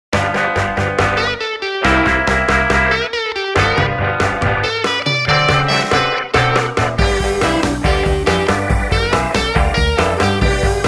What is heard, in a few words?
guitar